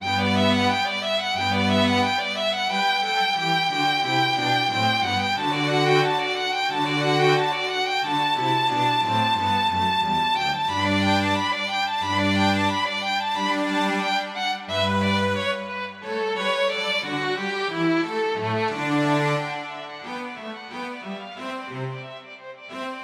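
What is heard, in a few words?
Vivaldi concerto G major for violin; a.vivaldi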